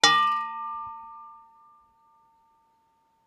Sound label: ambient; World of Tanks